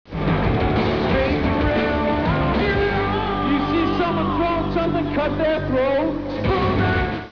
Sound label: throat